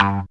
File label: GTR PIC; E-Lab; X Static Goldmine 2; akai